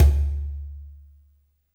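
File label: ambience industrial, ethnic percussion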